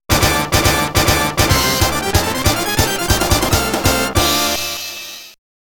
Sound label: LOSE (GAME OVER); Taito Digital Sound Archives Arcade Vol.3